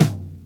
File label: SD, dance drums hip